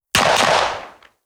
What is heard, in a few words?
Ingram M10 9mm 50m behind clean Double shots